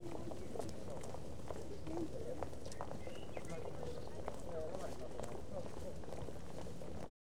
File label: SFX; Red Robin GG2 Open